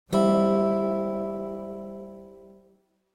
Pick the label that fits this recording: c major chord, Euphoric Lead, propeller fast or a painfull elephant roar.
c major chord